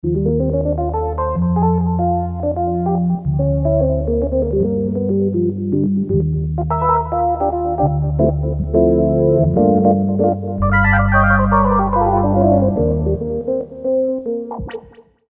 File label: Organ, Korg DSS-1 DWGS synthesizer; Korg DSS-1 Sound Library